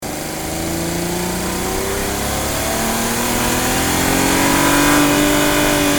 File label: engine ramp